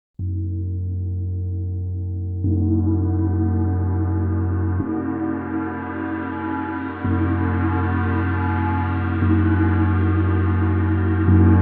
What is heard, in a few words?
SG 28 Struggle, Gongs